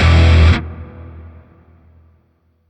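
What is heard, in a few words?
Shawzin Major Chord C; Warframe; Grineer Shawzin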